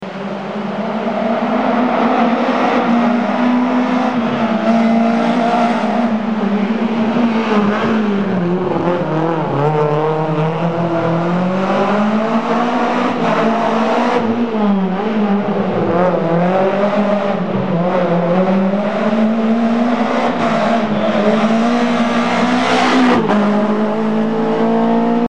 Renault Clio Williams; Cominelli

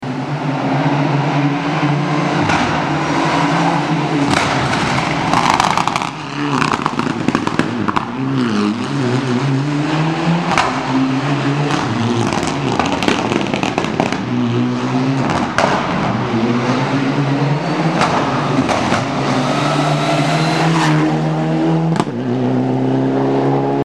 Mitsubishi Lancer Evo IX; Andreucci

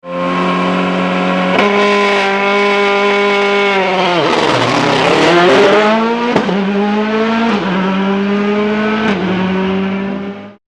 Skoda Fabia WRC; monza 2007

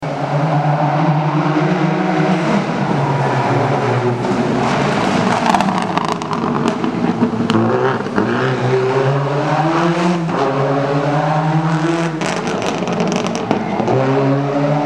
Subaru Impreza; Longhi

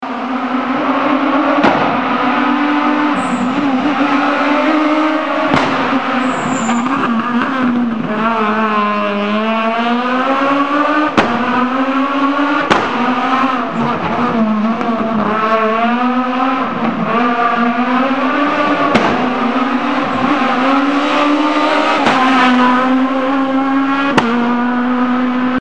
Renault Clio S1600; Signor

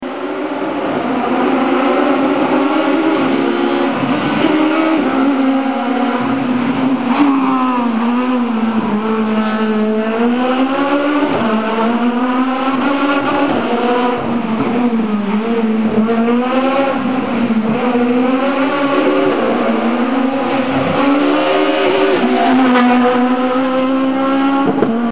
Peugeot 206 S1600; Guerra

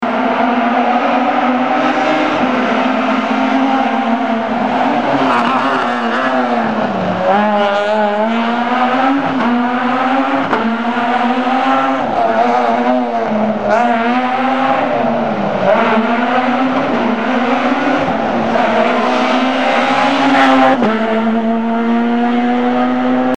Peugeot 207 S2000, rally; Rossetti

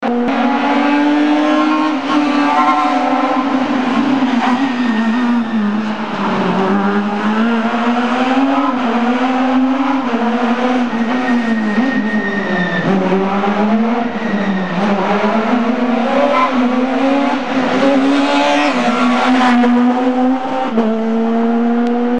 Fiat Punto S2000; Basso

